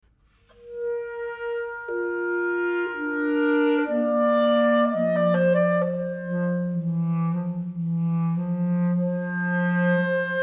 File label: CLARINET CLASSICA - Duo KV 487 Adagio